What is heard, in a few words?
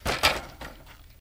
Bicycle Drop Clank